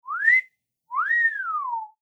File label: whistle